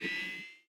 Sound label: Zoom Chunk; Insanely Twisted Shadow Planet